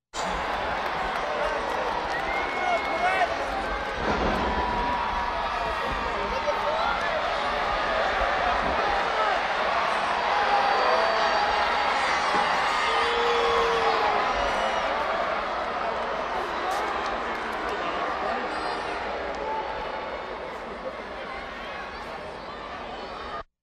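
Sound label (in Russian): шум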